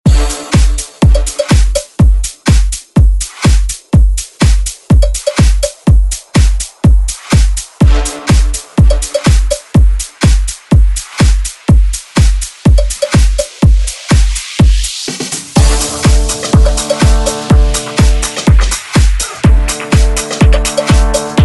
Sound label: Future House Edit